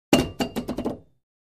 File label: Free Weights Exerci